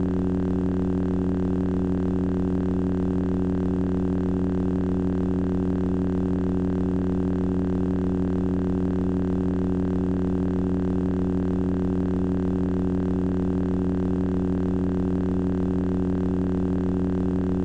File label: saber long, sons woonnssss mouvement; mouvement dans l'air